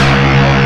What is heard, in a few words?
1 Shot Grind C#3; Digital Sound Factory Xtreme Lead